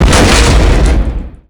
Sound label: ekgunnery heavyshell ap; Weapons; EK modern; Barotrauma